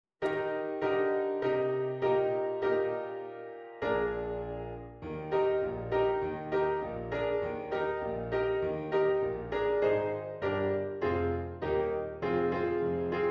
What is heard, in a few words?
piano